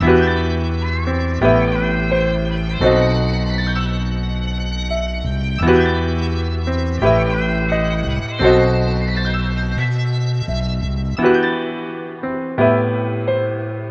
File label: 86 BPM E Min; Gems Vol 9; Dreamers; Cymatics; Gems Free Teaser Pack